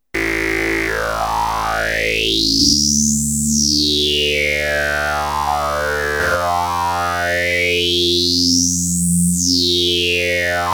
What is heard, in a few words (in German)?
formanten a e i